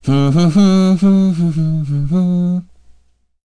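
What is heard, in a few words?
vox Hum, Korean; Ezekiel; kingsraid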